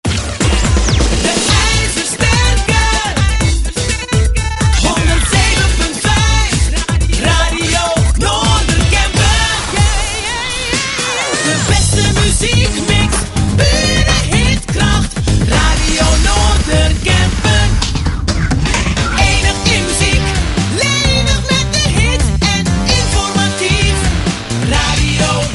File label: Jingles; RNK 2007